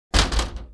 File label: glass; Mafie 1